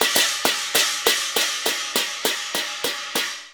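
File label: CHINA, cymbal; Spectrasonics; Heart of Asia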